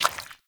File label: Footstep Default; Pirate; Warframe